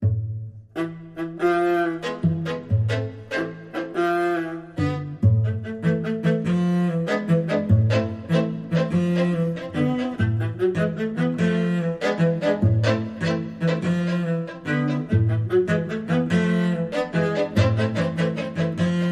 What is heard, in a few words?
Spiccato